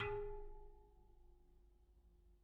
Bicycle Spoke

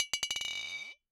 Bottle Struck

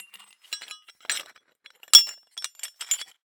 Hole Saw Scrape; Hole Saw Bits

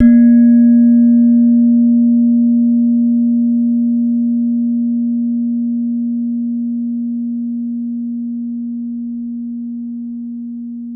Slenthem, Gamelan

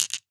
Marbles Rattle

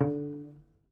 Prepared Piano

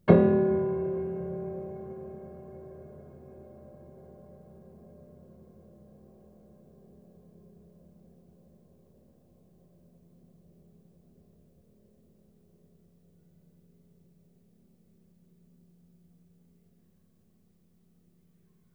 Harm Ped Low, Piano Harmonics

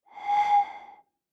Bottle Blown

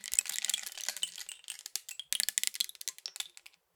Rain Stick, Percussion Samples

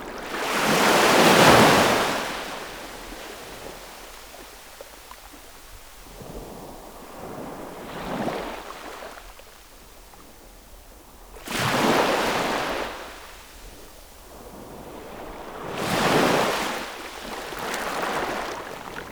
waves

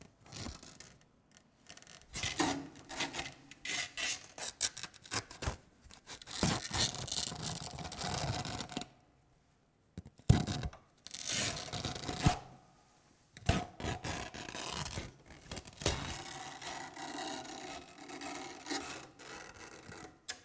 Piano Destruction Material Tear

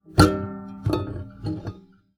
Classical Guitar Extended Technique

